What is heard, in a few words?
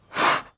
crate move; storage-chaos